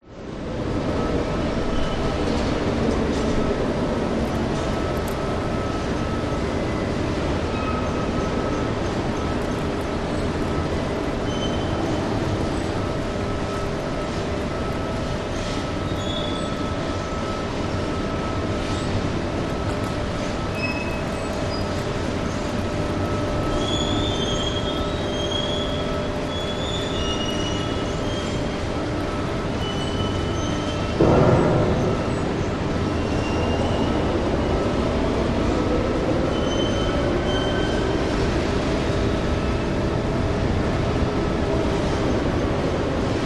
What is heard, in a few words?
Industry Ambience; audio hero